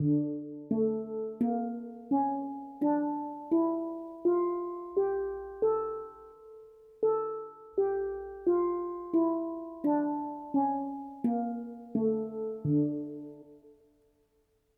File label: D Hijaz